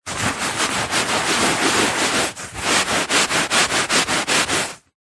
household cleaning wiping carpet with dry tissue paper fast wipes; zapsplat